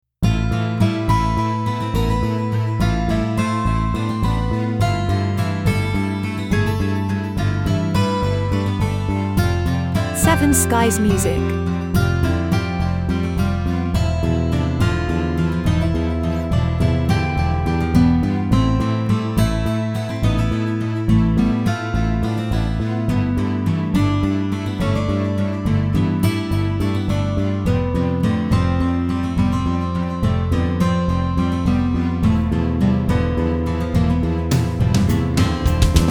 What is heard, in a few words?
Parisian Gipsy jingle